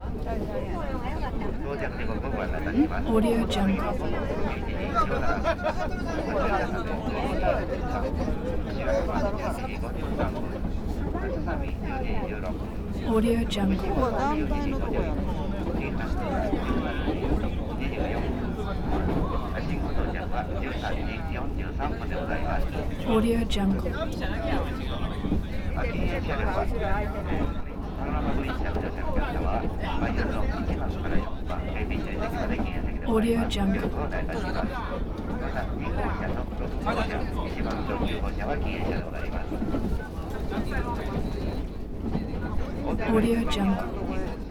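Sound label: passenger train interior constant semibusy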